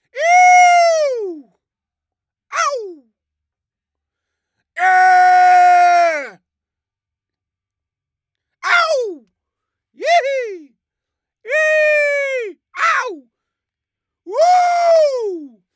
Guys Howling Fun

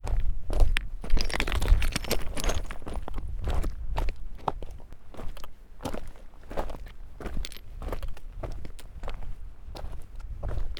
Slide Footsteps On Stone Path

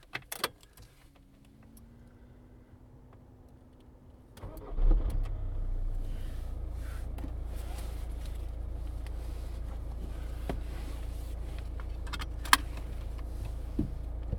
Car Interior Start And Depart

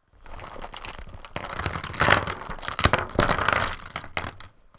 Cosmetics bag dump

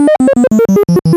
Collect Game Pixel Lose

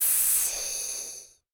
Snake Sound

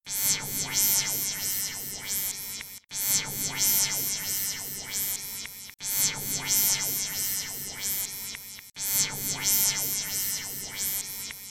Sonic Beam

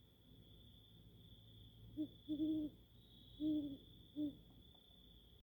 Owl At Night

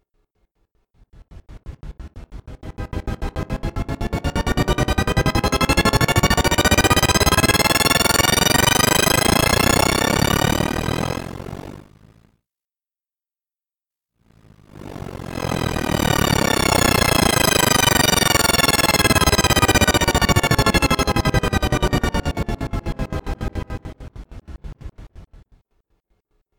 Chopped

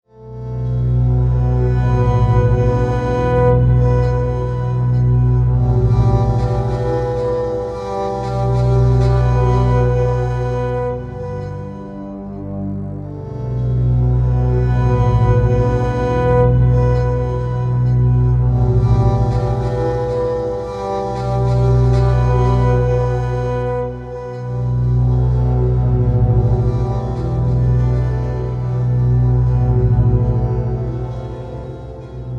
Middle East Story

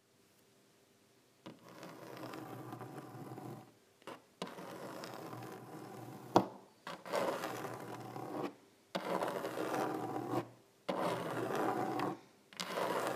Nails Scratching

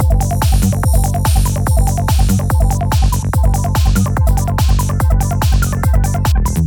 Psychedelic Trance Beat Loop

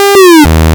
8 Bit Fail

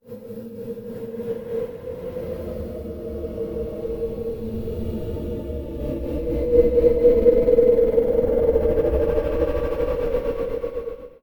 Ambient Atmosphere

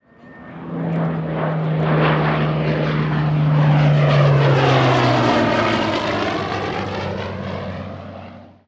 Hurricane Flyby

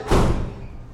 Closing door

